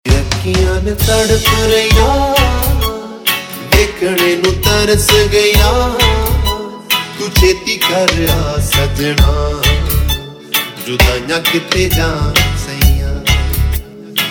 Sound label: Unplugged Ringtone, Punjabi Pop